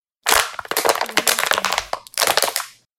plastic crumpling

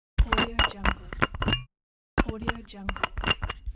bottle open and close wooden lid

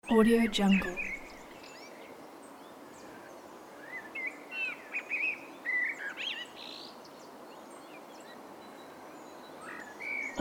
birds animals

